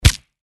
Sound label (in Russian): hrust chego libo